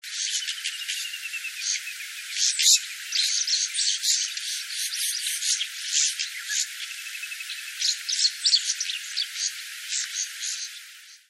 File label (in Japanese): nobita